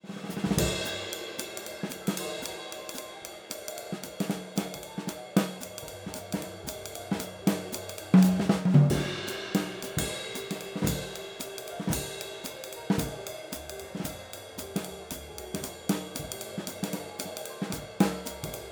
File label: Overheads